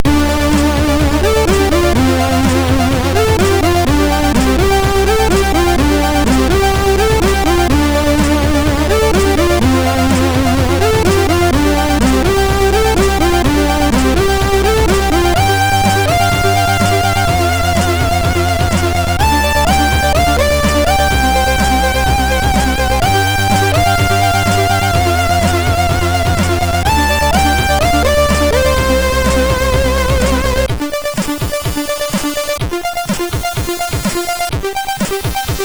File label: Beat, sid MOS6581R2